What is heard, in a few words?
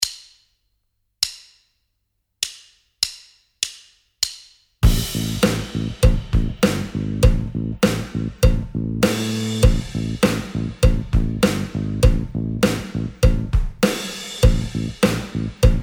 Open String Riffing